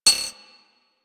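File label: machine gun mech; Weapons; Archwing Mach Gun B; Warframe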